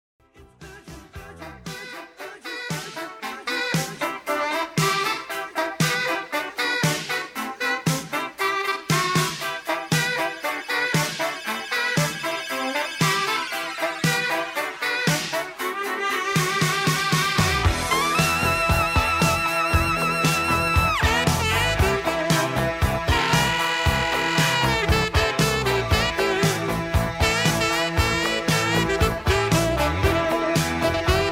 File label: sax solos